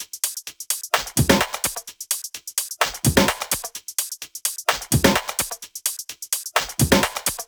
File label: 128BPM Tribal Kiss Kit